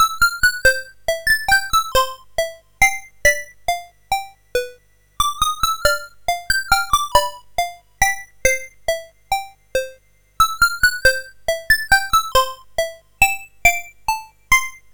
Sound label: Music Box, MOS6581R4